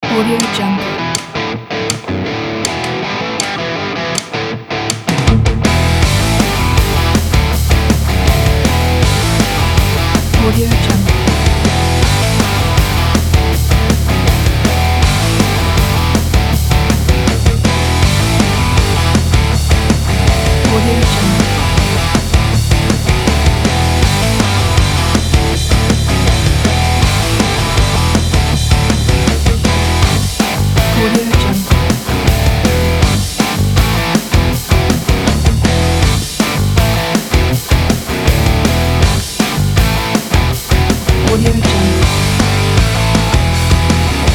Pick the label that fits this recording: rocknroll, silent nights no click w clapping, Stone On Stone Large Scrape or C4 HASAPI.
rocknroll